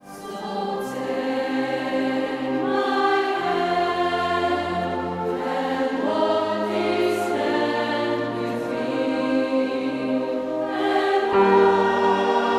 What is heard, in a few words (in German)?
bass; franziskuschor